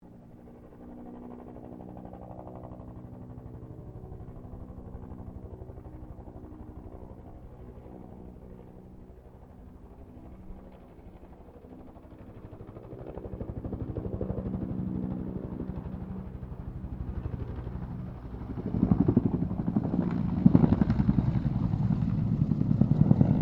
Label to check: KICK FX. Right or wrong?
Wrong